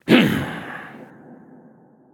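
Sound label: non verbal yay, voice; cernunnos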